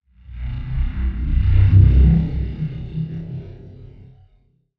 Whoosh

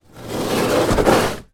boat kayak pull movement on hard ground

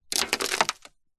foley dust debris sand pour over car window

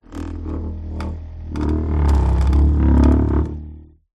household chair dining drag wood floor

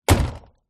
truck door old close

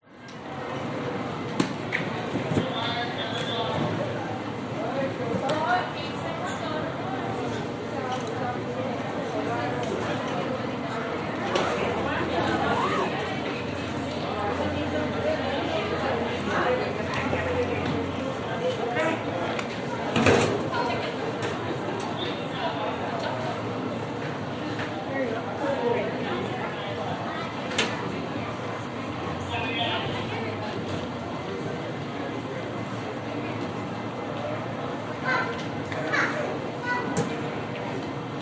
Airport Check In Binaural; LAX; T5

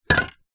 industrial rust spray can knock over surface

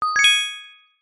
multimedia game sound gem treasure found

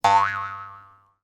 cartoon spring bounce twang

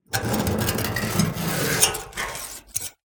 Just Metal Scrapes and Scratches; soundbits

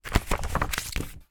poster stick to wall hard clumsy; foley

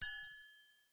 musical triangle single hit resonate

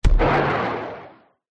medieval fire arrow fire

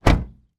transportation car door shut external; sound effects one

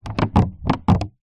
plastic fruit tub packet pressure squeeze; foley

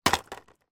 skateboard drop onto street; sport

